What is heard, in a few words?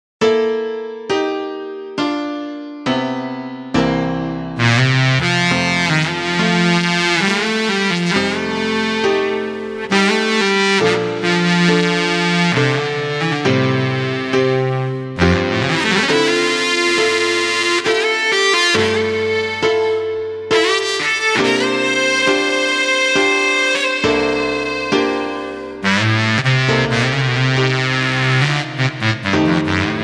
Amazing Bari Sax